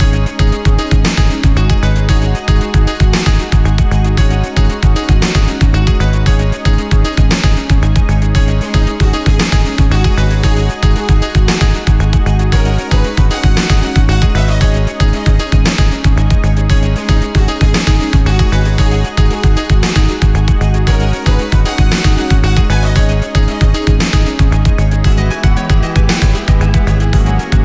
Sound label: Stage Beginning Loop; Cipher Circuit; gravity circuit